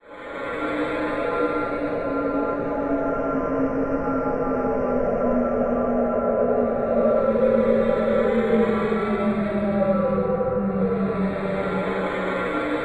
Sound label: TIMEWIND; Best Service Advanced Media Trax Building Blocks; WAR GAMES